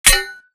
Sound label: imp bullet light ballistic titan; Titanfall 2